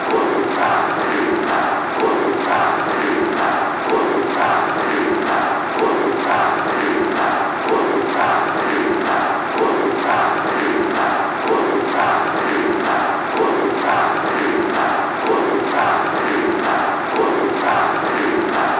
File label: fanchants, ukraine